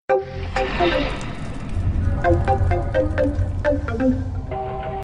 sms; electronic

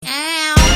oww

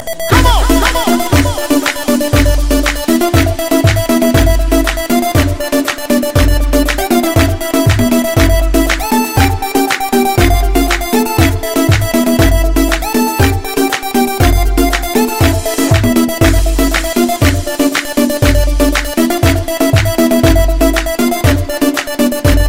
Bubble Bass

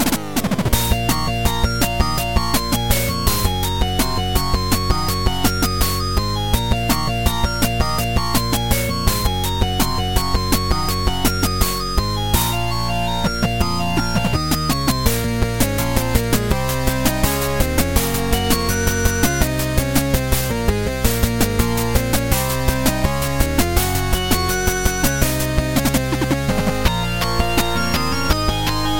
8bit Battle, ringtones

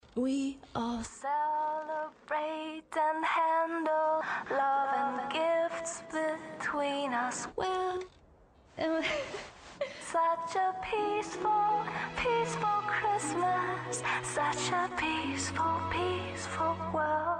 peaceful christmas; ringtones

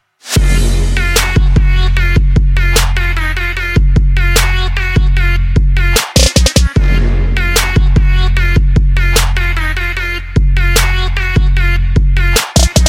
sick trap music

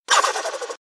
turbo blow off valve

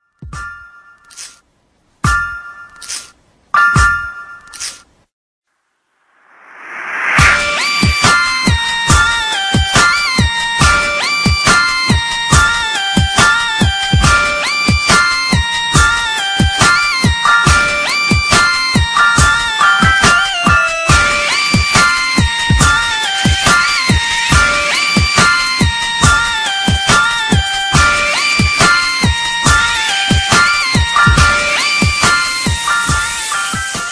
dubstep

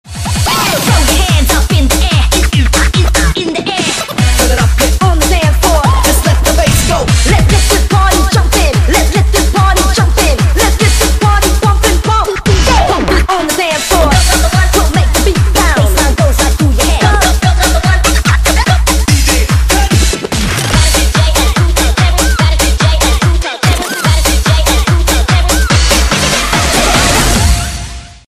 Trance Mix

